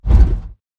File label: bt footstep jog parts; Titanfall 2